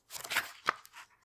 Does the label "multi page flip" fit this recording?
Yes